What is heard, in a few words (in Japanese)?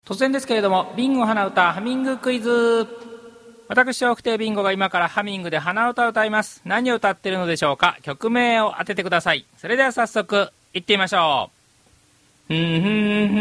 hanauta